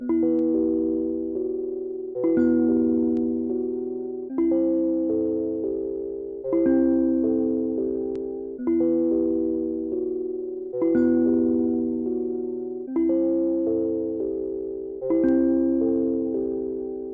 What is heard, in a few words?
Dark Melody Loop - 112 BPM E Min Bells; Melodies; Loop Stems; Cymatics; Oracle Sample Pack